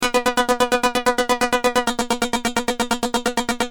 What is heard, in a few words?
Keys, Industrial